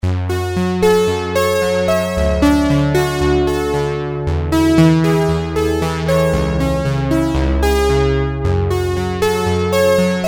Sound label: P600 Pan Mono Stereo AB